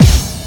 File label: drums, combi drums; PS-28 Vocal House Samples